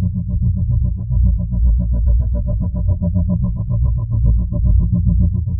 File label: colossus assimilate beam; apocalypse